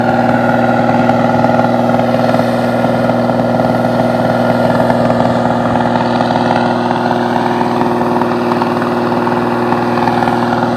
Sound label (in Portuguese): motor